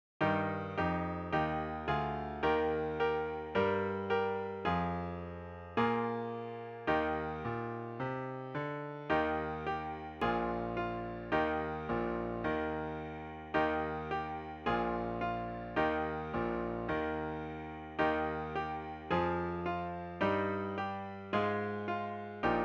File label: Solo Three Eb brass and Clarinet